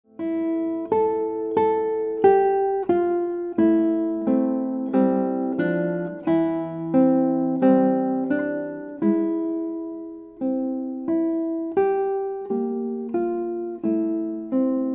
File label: Guitar